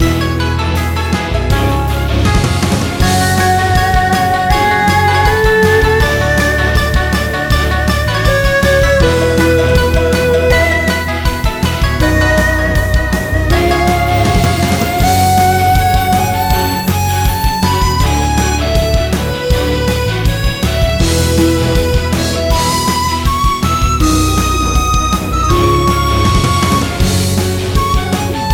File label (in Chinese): 背景音乐